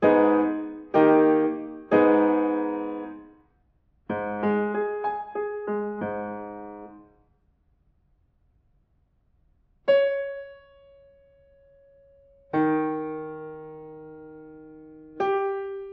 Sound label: diatonic collections